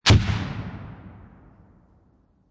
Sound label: M67 HE Grenade; Grenades; Explosions; VRSonic